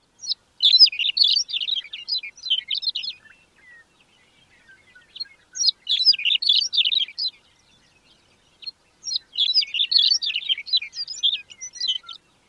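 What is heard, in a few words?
songs, bird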